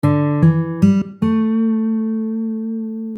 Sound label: vibrato